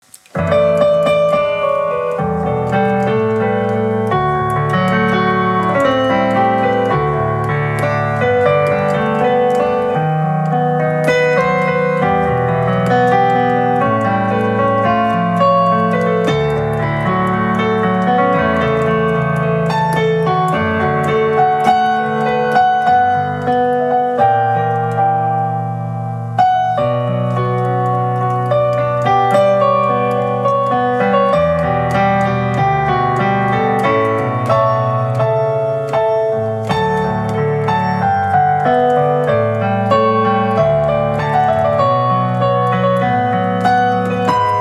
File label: piano